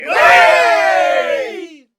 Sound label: pirate crew hoop cheer; arrgh-hoops